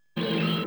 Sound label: Menu Popup